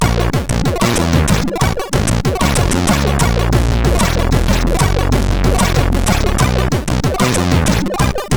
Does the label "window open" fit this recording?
No